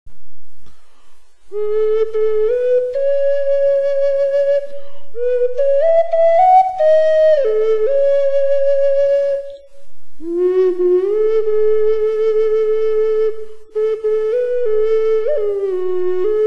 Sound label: eagle sound, flutes